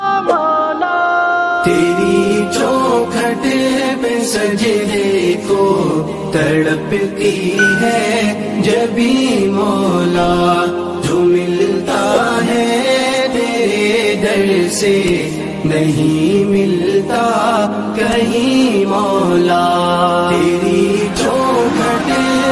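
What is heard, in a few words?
Islamic Ringtone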